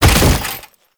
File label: Body Fall Light Default; Enemies; Warframe; Tau Dax